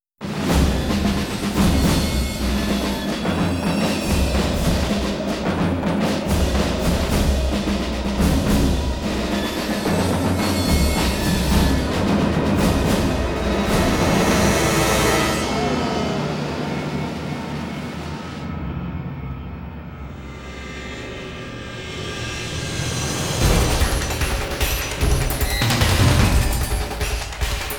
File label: gas drone and fight; battlefield earth original motion picture soundtrack 2000